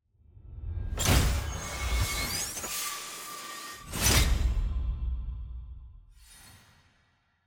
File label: level up; champion mastery